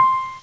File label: piano soft